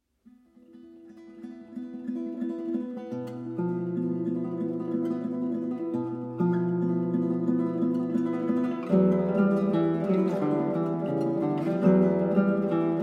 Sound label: studio-milonga guitar